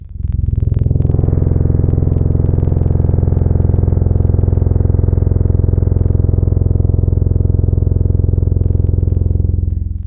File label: Horn; Synthetic's Serum Collection; Echo Sound Works Core Tables; ESW Real